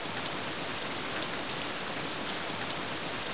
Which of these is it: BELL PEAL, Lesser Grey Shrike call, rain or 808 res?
rain